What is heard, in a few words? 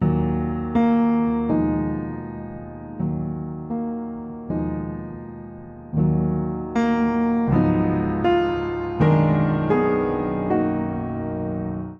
Progression Eb Minor 80 bpm, Upright Piano; MIDI Clips; Melodrama; Ableton; Factory Packs; Spitfire Audio